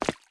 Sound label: Step Walk Puddle; SandBox Musics